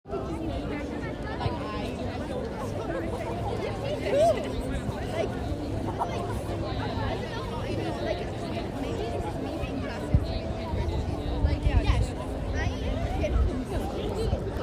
East Meadow Park

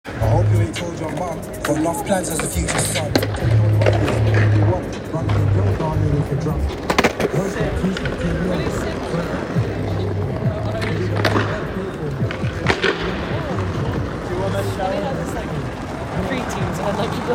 Bristo Sq

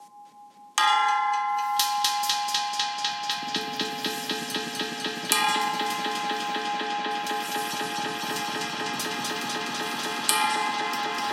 Mix plus reverb

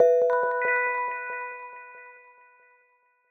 UI Cursor

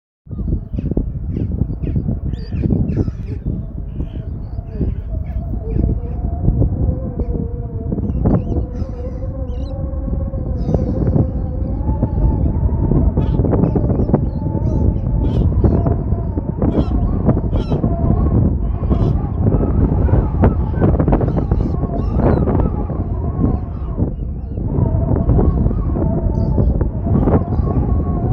Wind Whistle

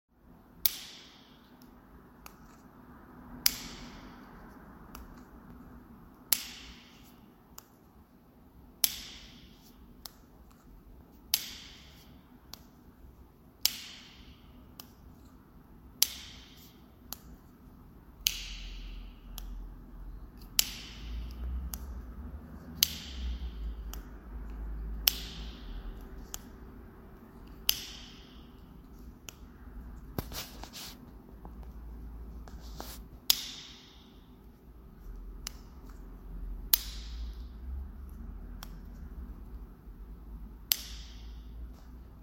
Pen in Studio

